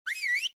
whistle

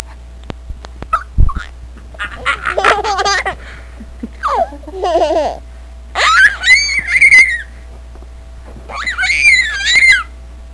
Mad laughing

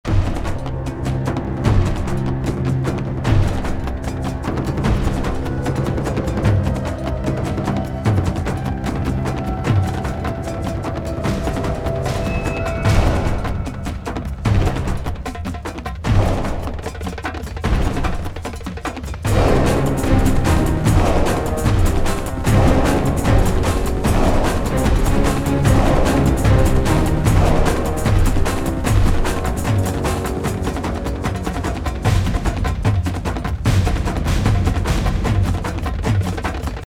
music

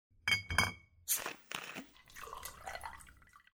party pouring drinks

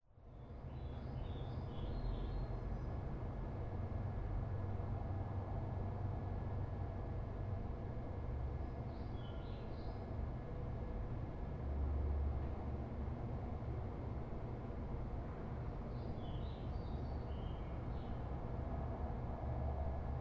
ambient dorm

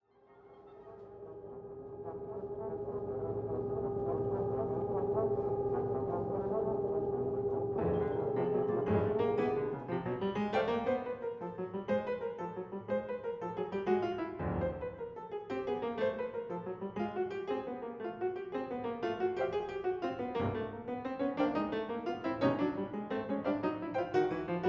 piano conc

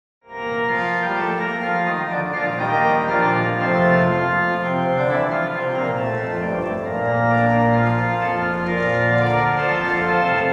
organ

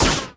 laser

